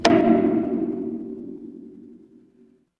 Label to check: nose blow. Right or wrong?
Wrong